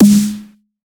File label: Dubstep Snare